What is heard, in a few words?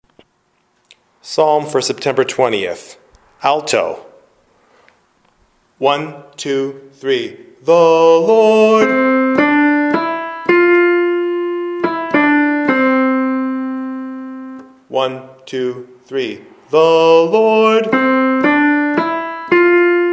alto, choir; psalm54